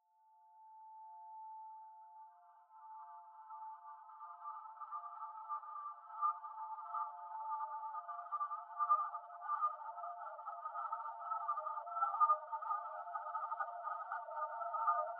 Flies; Garden

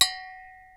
metal clank

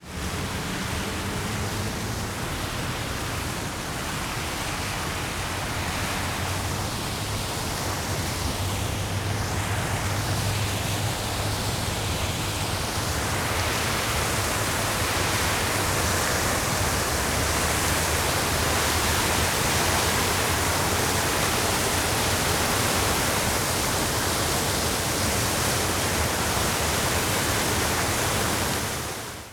Masking Fountain

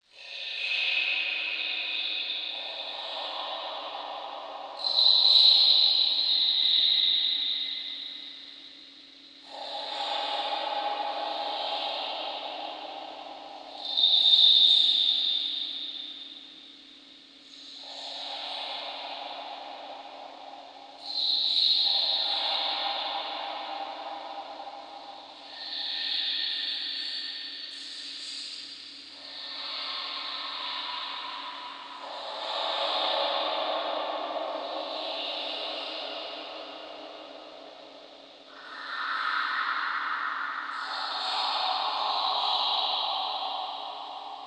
Well Splash

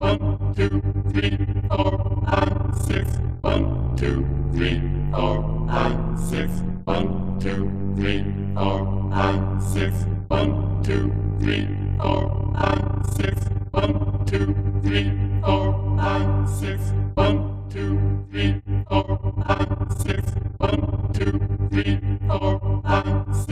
ringmod

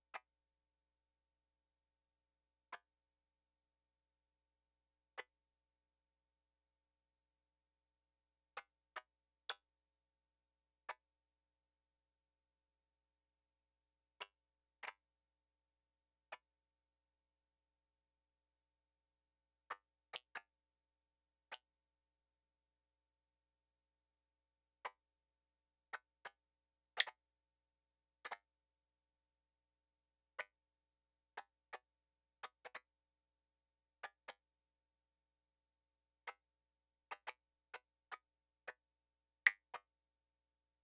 Creek, Garden

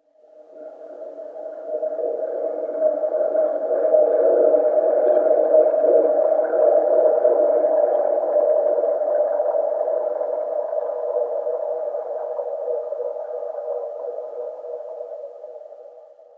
Water Stream, Chalice Well

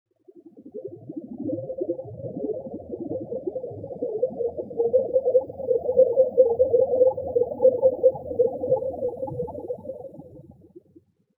Bubbles Grains Fast Sine, Chalice Well

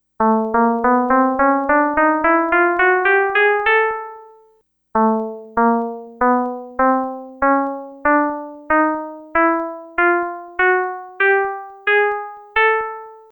Scale Pythag

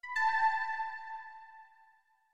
ping

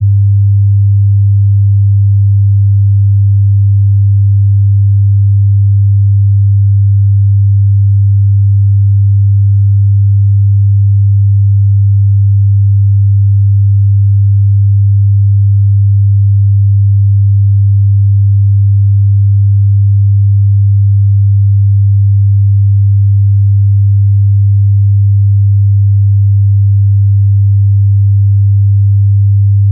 Sine 100Hz

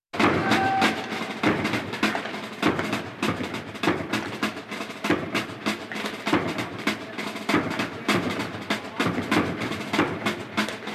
Band - military marching band

